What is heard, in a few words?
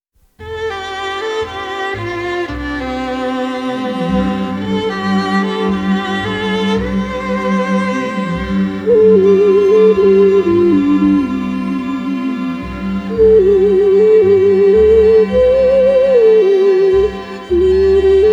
xun